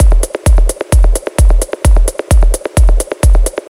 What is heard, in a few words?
warm tech kick and fill; drum loops